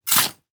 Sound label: Impact Shovel Dig In Dirt